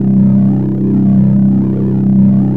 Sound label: SYN, Drum & Bass 124 BPM; Ueberschall; Drum & Bass Flash Trackz